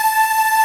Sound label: Ending loop